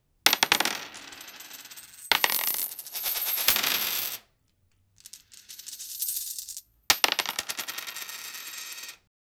a heap of gold coins

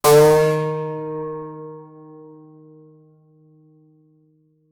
single hit snapped string

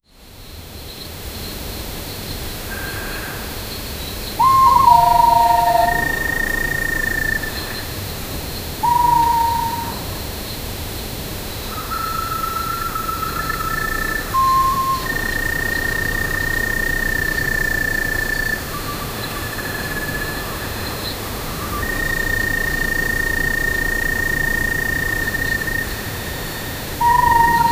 the sound of a wet, moonlit

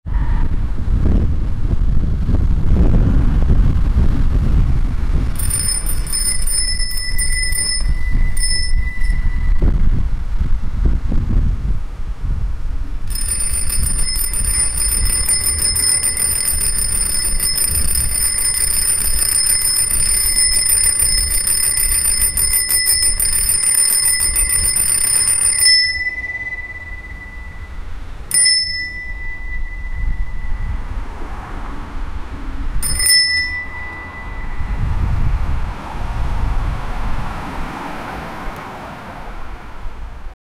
a bike on the street

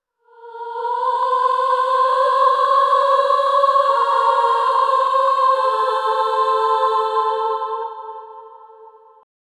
angelic chorus singing hi

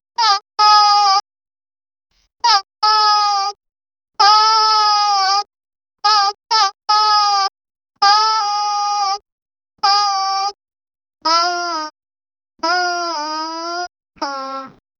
funny cartoon slip sound; wah-wah

